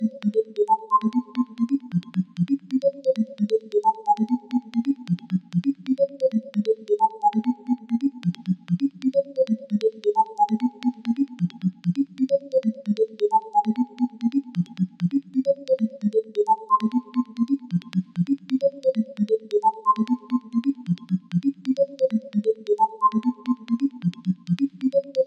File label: techno key bleep flute delayed Fm; instruments; loops